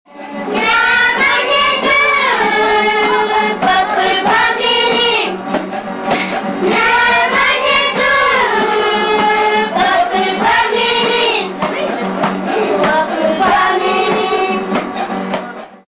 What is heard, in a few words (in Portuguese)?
treinamento canto das crianças